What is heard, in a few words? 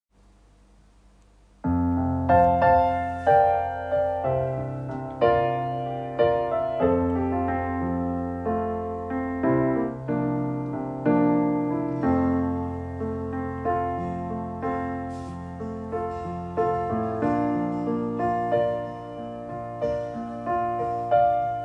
sop